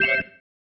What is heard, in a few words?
Stab, Organ, One Shots